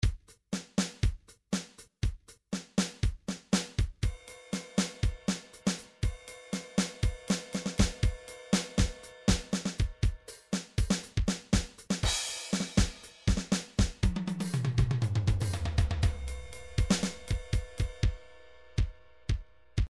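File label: rock basic, midi; Sonar Rk Basic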